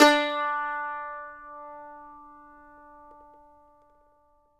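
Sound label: D, Mandolin; Sampleheads; Whole Lotta Country 1 & 2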